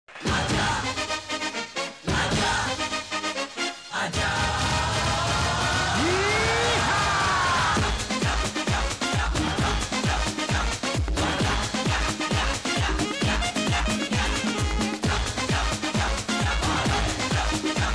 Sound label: comedy bgm; bhagam bhag